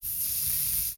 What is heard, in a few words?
Deodorant Spray; household